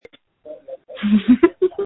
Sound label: screams